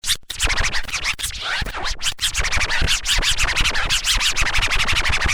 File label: DJ Tools - Scratching Samples; sample pour scratch